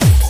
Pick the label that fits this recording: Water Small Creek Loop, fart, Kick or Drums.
Kick